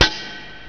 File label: hit